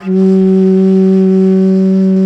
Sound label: BASS BANSURI; E-MU Producer Series Vol. 7 – Old World Instruments